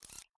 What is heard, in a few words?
Video Record, ui